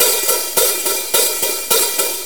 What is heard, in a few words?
4-4 HiHat Loop; Sonic Foundry; Drum Tools